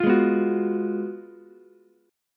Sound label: Gb Major 7th Suspended 2nd Suspended 4th Gb Ukulele; strummed; 10,8,7,9; JGuitar